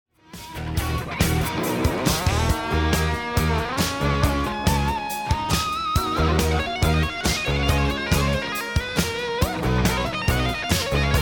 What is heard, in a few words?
bomb planted; music kits custom